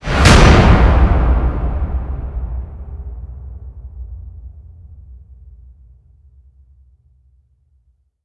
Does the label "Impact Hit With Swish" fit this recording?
Yes